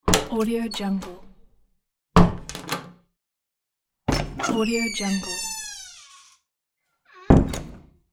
door; audio therapy

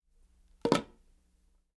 headphones

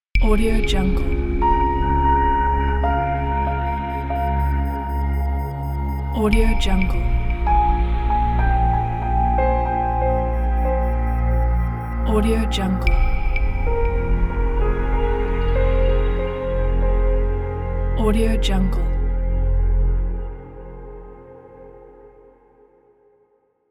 future ambient logo; smartpiano

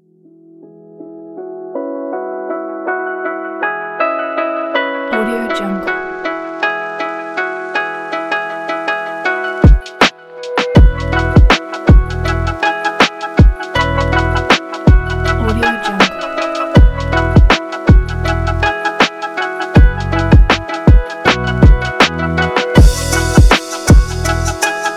lofi chill

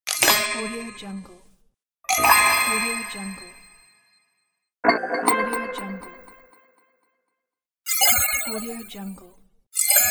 cartoon spring; volkovsound

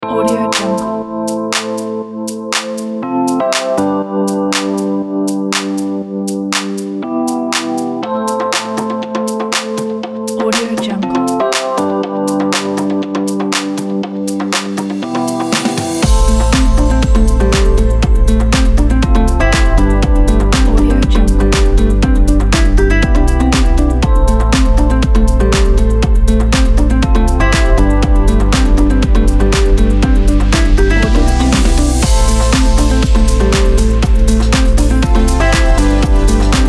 fashion house

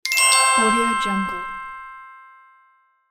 diamond sparkle; medusamusiclab

